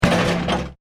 Metal Impact Concrete, Short metal junk rattling impact sound; Machinery Sound Effects; Heavy Machinery; LYN106 package of Heavy Machinery